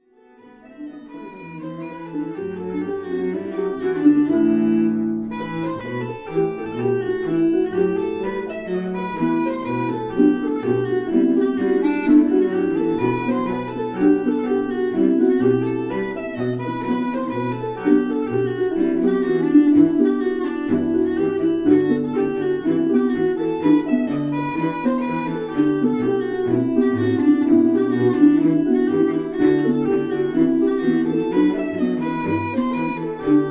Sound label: Uilleann Harp Trio, dance fragment